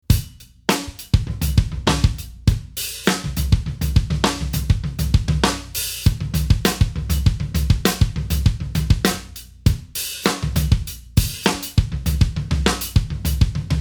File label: 3 Note Study, jazz drumset